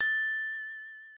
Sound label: Unused Ring; Sonic